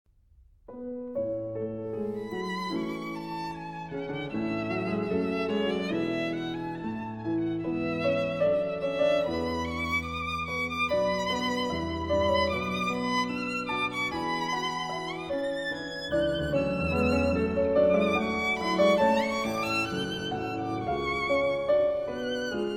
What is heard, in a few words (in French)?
Sonate en la pour violon et piano; Franck